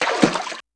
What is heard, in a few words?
Deep Step Right; Movement